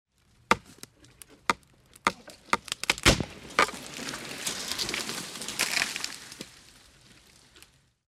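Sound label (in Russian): tree chopped down with an axe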